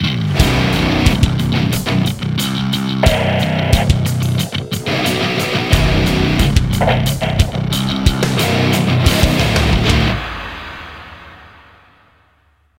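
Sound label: Multiplayer Level Start; modern combat 3 fallen nation; android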